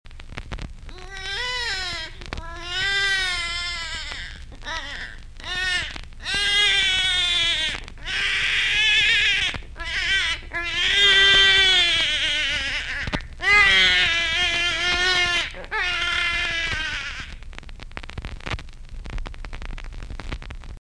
bebe

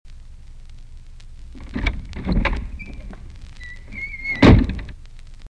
puert

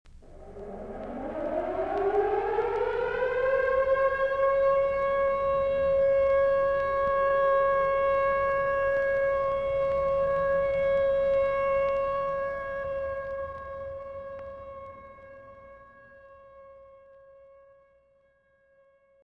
sirena

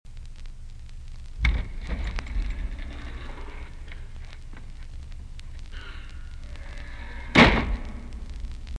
puert

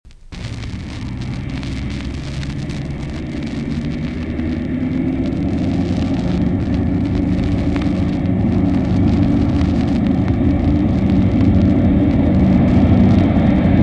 avione

